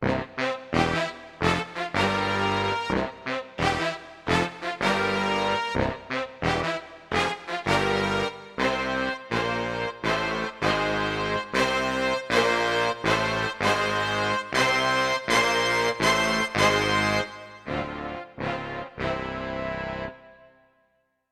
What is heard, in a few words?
BigHornz old-timey raunch, XV-88